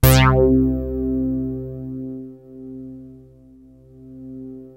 BASS FORM; MORPHEUS; Syntec; Wall of Sounds

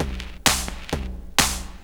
straight, Techno Trance Essentials drum loops

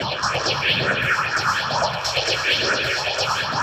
Industrial; Sonic Foundry (Sony Creative Software); Underground UK House & Electro

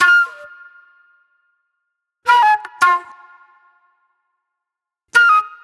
Wild Flute

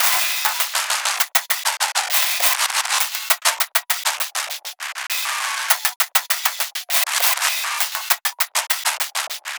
8 Bit Beat, Modular Electronica; Sonic Foundry (Sony Creative Software)

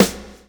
BLUE FB, BLUES; Spectrasonics - Backbeat

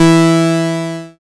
BAS MKS-50, Roland

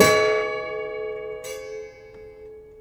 Prepared Piano; Battery 3 Sample Kits

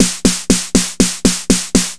Snare, Trance

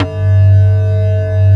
TABLURA

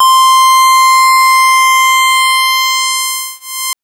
Synth SidPulse c5; TrashLibrary

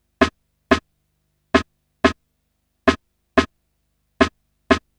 Snare Loop; Sonic Foundry (Sony Creative Software) - Fluid Dynamics Drum & Bass; Construction Kit

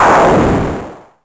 WN FM HIT, ANALOG PERC; Zero-G Ambient 1

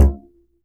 E string upright bass; Upright Acoustic Bass

